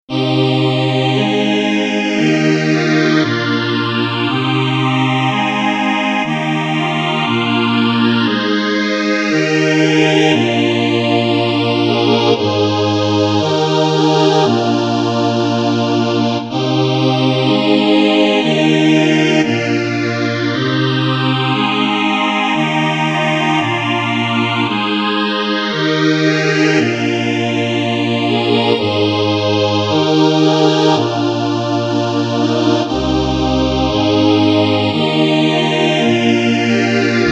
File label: Nord Grand Crumar Performer Phaser